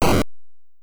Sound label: lever gets switched; 8 eyes